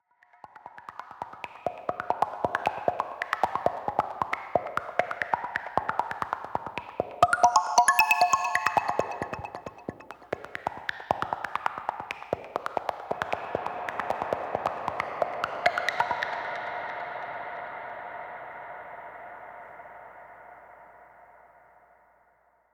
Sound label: Plucky Outro